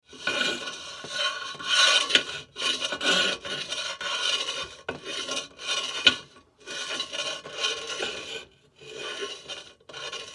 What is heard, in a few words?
Cannon Clean Barrel; Warfare; Blastwave